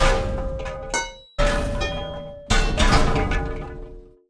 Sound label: Weather-Disruptor Collapse-Pipes